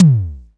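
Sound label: snare drum, Tama TS-305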